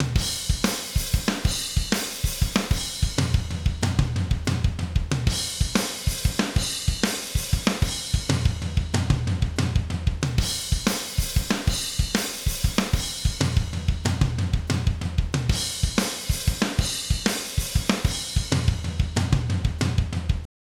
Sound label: drum Loop; Neve emu